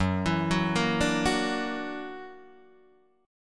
F sharp Augmented 7th F sharp Guitar Open D

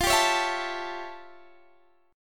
A 7th F, chord; A7/F; strummed

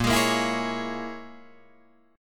Bb Minor 7th Flat 5th, Guitar, Drop D

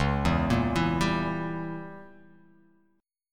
C sharp Diminished 7th C sharp down

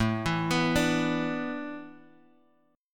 A Suspended 2nd Suspended 4th, Bass Standard; Asus2sus4 arpeggiated

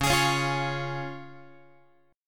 C sharp Major, Guitar; x,9,11,11,9,12; strummed; JGuitar

